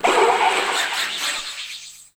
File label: BAND, anime sound fx, comic